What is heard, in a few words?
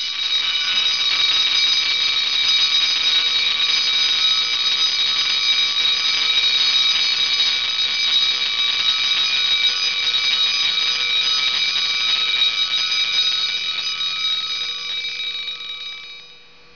alarm sound